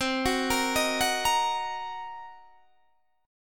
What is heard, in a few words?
C Minor 7th Flat 5th Guitar DADGAD, chord